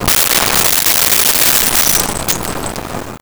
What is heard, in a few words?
Bottle Break; Impacts; SONY PICTURES SOUND EFFECTS SERIES